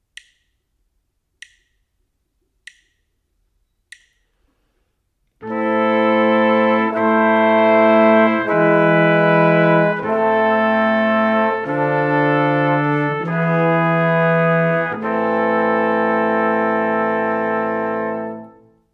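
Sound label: harmonic dictation, brass quartet; ear training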